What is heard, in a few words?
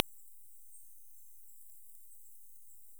Myotis macropus